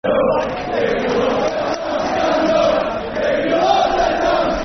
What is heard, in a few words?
Stand Up If You Love The Town, Football Chant; Soccer Songs; fanchants; Huddersfield Town Htfc